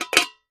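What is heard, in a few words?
Pot Lid Metal Closing, cooking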